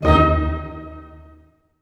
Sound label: STAC D#, ORCH STAC; Sound On Sound - Peter Siedleczek's Orchestra